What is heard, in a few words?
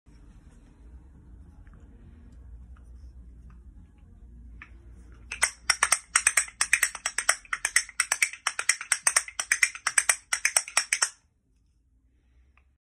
Castanets